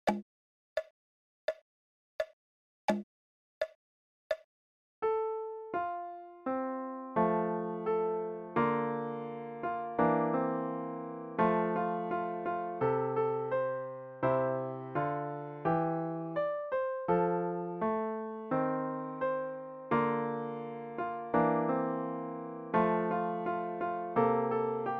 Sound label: Swing Low